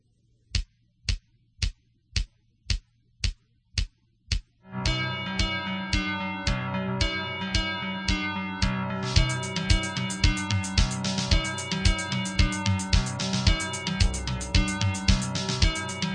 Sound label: Glissando; Porto